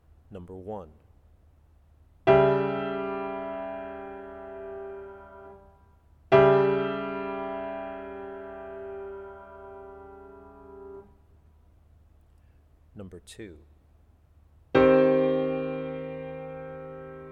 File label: chords; ear training